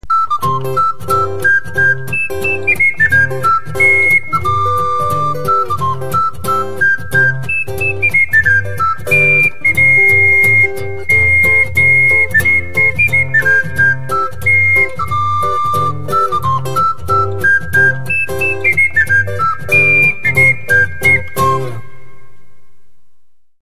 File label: andes